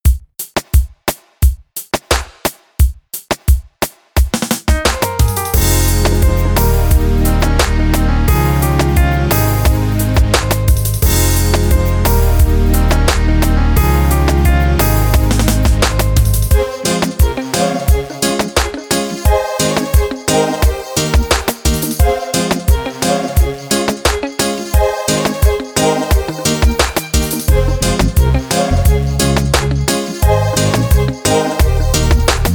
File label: Instrumental